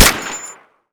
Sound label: QBZ95